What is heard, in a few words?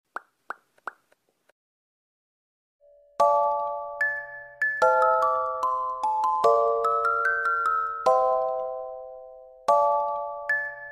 music box ringtone anime